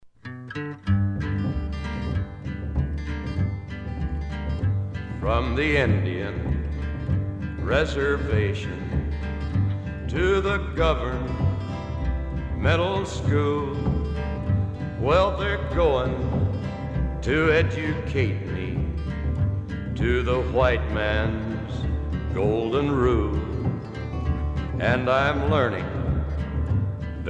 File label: Drums